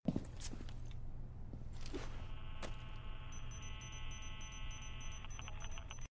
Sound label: C4 thrown towards; rainbow six siege